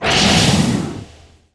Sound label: Fire Cast